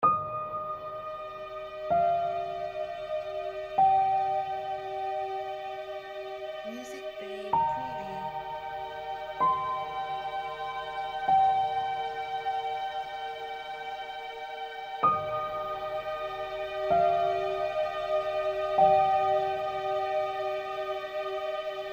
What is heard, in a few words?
Warm Emotional Angelic Piano Strings